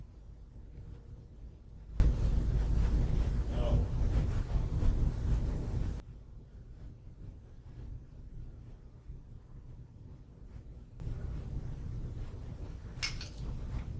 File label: NO and something drops